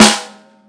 Ring

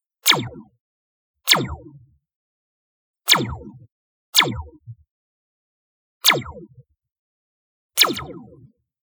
Laser Gun

